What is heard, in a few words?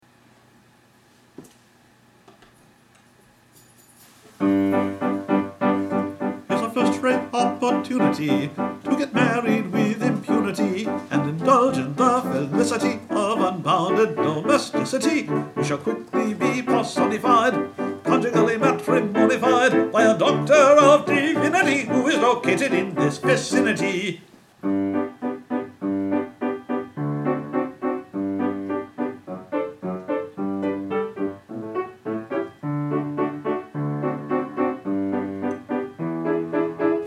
tenor; Pirates Penzance Sudbury